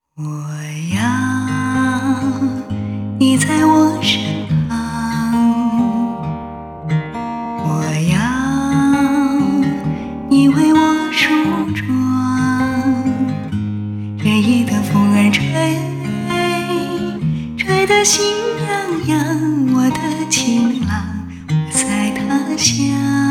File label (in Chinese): 歌曲演唱